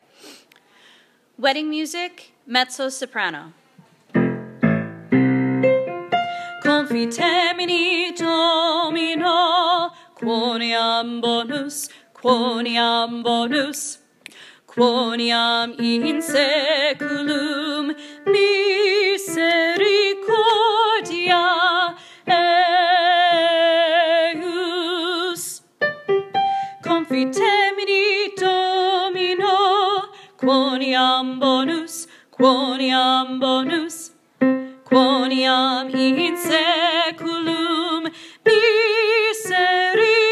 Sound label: wedding mezzo soprano